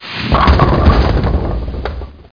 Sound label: SMACK; How Many Bugs in a Box